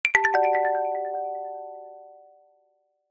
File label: Additional Alert; Lumia Ringtones